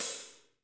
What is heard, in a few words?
HH, KIT Real-Kit; Real-Kit s12